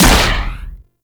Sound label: Arch Railgun 2D Shot; Warframe; Archwing